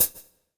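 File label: hi-hat, drums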